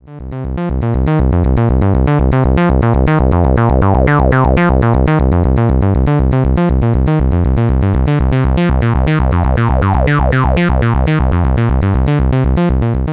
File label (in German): 12dB mit pos und neg Resonanz